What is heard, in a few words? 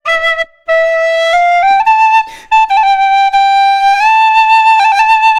Penny Whistle, Celtic; Sonic Foundry (Sony Creative Software); Continental Drift Aftershocks